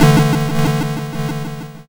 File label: YM Chord A; ChipShop Deluxe; ChipShop Hits